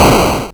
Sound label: Arcade Riffs